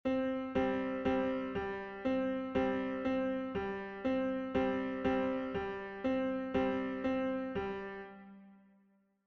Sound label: melody tonalities